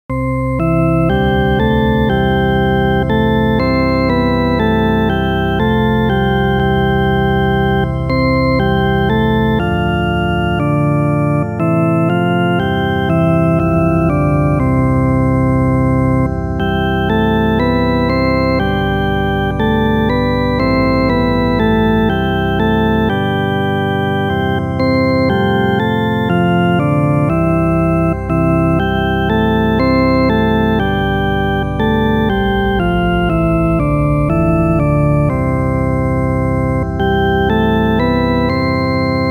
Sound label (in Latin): solo org salve Regina, organo